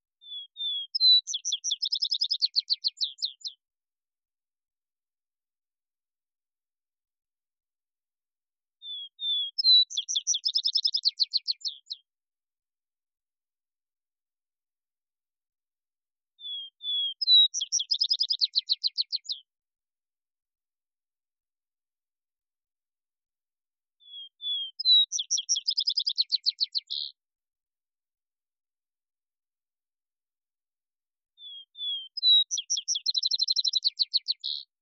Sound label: Vesper Sparrow; Ontario Nature